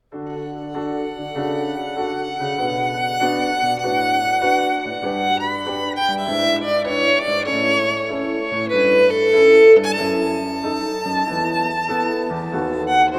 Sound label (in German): Air Bach swinging